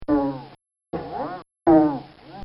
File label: Cartoon Sound Effects Boings; Cartoon Sound Design